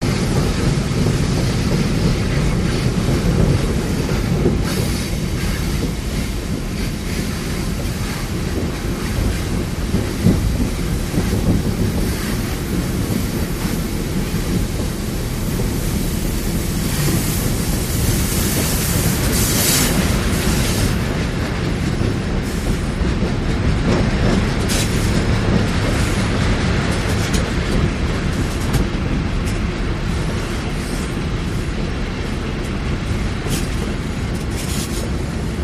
Diesel Loco 50 Mph, rails & rumbles

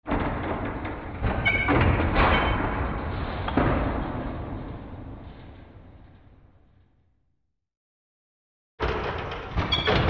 Metal Machine Eerie, ambience

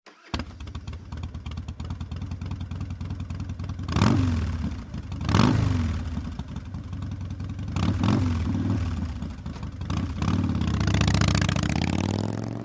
harley away, harley-davidson

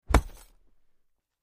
Chain Metal Hits

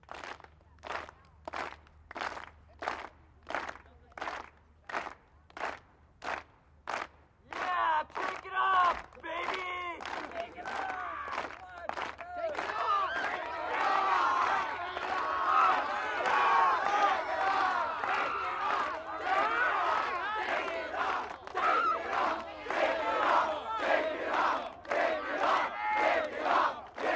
Catcalls From Rowdy

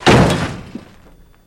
Jeep Crash Jeep, car crashes

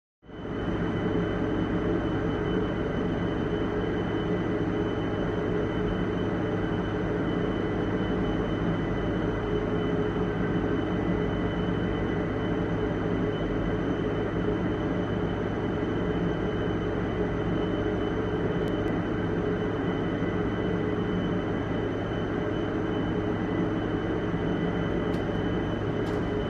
Industrial Machinery - Sewage Reclamation Pla; Pump Room Ambience, W Medium High Whine And Dista

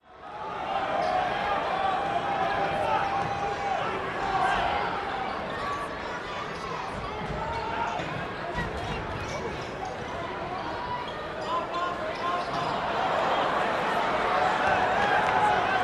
gym crowd cheer, cheers

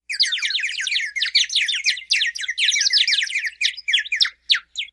Knockout Chirp Bir, animals bird bgs

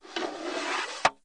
Tripod Leg Telescop

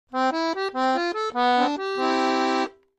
Accordion Arpeggio; keyboards

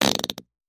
Close Up Fart

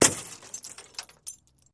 Glass Smash Auto Hea

